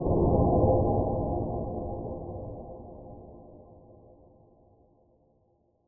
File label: cave, ambient; minecraft; SMCNV-pack